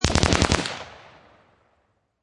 Machine Gun Colt Ar-15 Short Burst Close Range

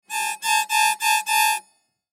Toy Accordian, Toy Accordion Quick Stabs; Musical; Miscellaneous Toy; Cartoon; Toys; Blastwave

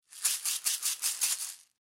Food Seasoning Bottle Shake Heavy; Household

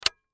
Hedge Trimmer, Hedge Trimmer Switch Off; Hedge Trimmer Switch On Garden Tool; Yard Tools; Household

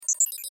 Sci Fi Robot Bleeps High Fast; Robots

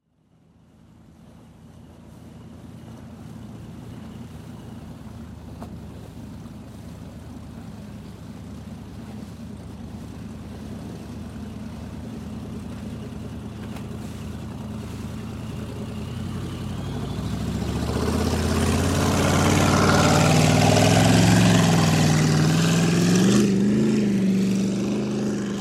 Boat Idle Accelerate Drive By; Transportation Watercraft; Boat Sound Effects; Blastwave

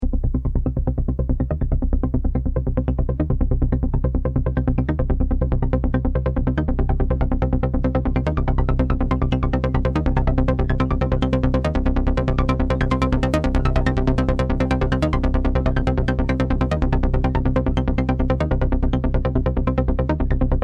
Techno Trance Supernova Loop In C 142 Bpm; Royalty Free Synth Loops

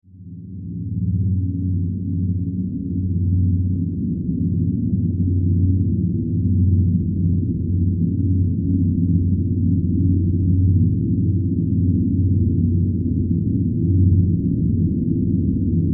Horror Drone Rumble; Evil (Sound Effects:Horror)

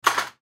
Air Ratchet Set Down; Power Tools (Sound Effects:Tools); Blastwave

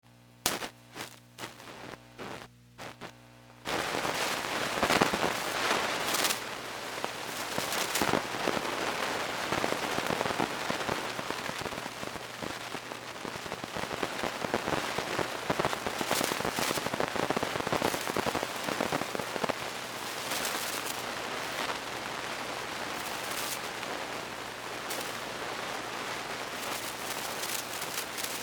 Static tube amp ca1960s, Static, Tube Amp; Constant, Scratchy; Tube Pa Amplifier Static , Constant , Some Bursts Of Noise; Electronics

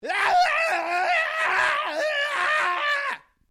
MALE SCREAM LONG; Screaming; Voice

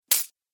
Coins Drop; Money

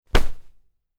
Cloth Character Movement Foley Whoosh

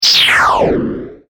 Production Element Whoosh Distortion Descend; Sound Design; Whooshes

